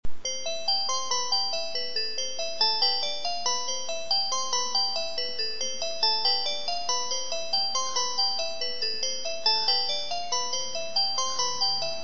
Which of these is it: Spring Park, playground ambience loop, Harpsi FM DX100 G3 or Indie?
Spring Park